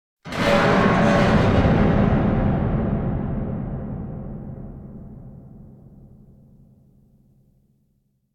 Impact Metal Drop Distant Shock Hit Strong Metal Strike - Reverberant; Hollywood Edge; sound designer's toolkit v2